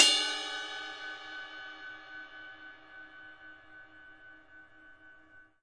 beauty ride, long

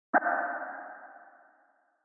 Short Chant; drums; hip hop; STYLE KITS (Hip Hop, Etc); Khezie Hip Hop Kit

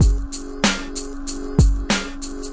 mellowchord groove loop, 95 bpm; 091 to 100 bpm

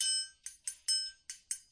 triangle pattern, drum loops and breaks, 070 bpm; 000 to 080 bpm

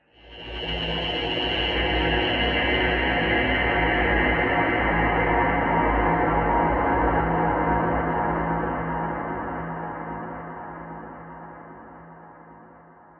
thick warbling synth A1

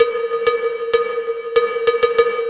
96 cowbell giant reverb; 091 to 100 bpm

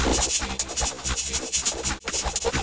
alien radio waves, Radio FM synth type stuff

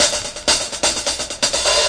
real hard hats, drum loops and breaks

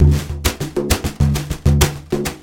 Frame Drum 160

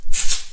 rainstick shaker; ETHNIC and WORLD PERCUSSION

